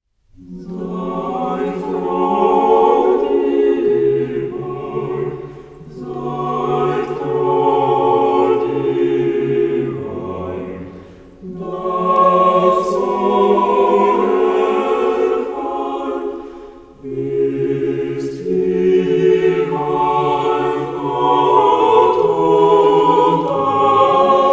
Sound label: Chorale; Bach; Christmas Oratorio, BWV 248; Chorale No. 35, Seid Froh